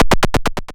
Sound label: Cry